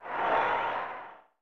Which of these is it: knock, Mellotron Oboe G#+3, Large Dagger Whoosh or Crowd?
Crowd